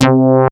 FAT TG C4, BASS; Q Up Arts; Dream Experience

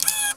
gate down up; PlatinumPhattCD1; E-MU Producer Series Vol. 8 – Platinum Phatt; Best of Phatt

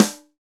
snare ringho; Roland L-CDX-01; Snares 6; Sn Modules 6

